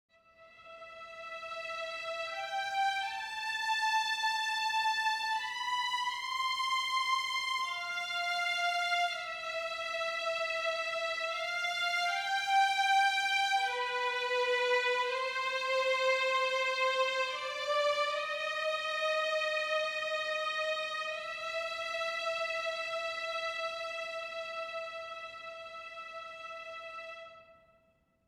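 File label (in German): Violine Legato Low Position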